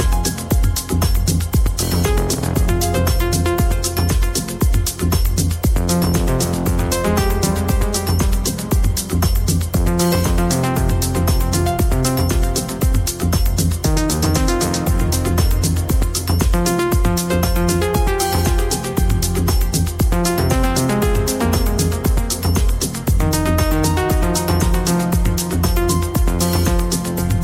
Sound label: Samsung Piano Ringtone